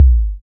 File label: BD, Synth; Sound & Vision - Gigapack II CD 2